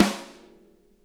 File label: snare, drum kits